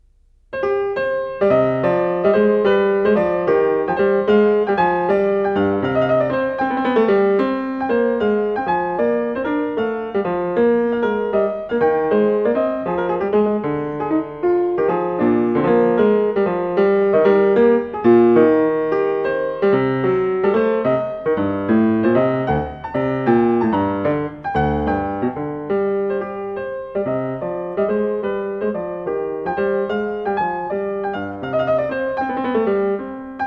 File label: Gigue; Glenn Gould; Bach The French Suites Nos. 1-4, BWV 812-815